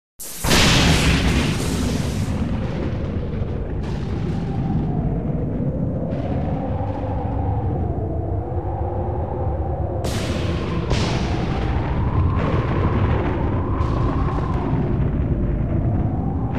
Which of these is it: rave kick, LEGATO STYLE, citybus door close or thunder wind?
thunder wind